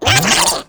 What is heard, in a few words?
dark bum payout